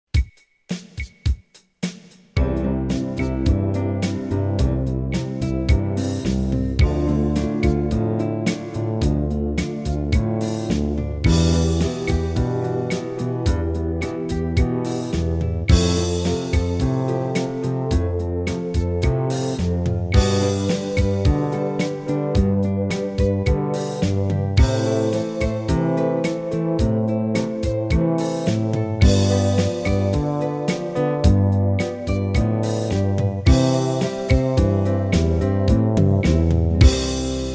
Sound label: 1st Inversion Toss For L.H. Slow, piano